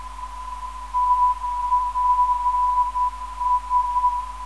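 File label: non-directional beacon; TPS; 304kHz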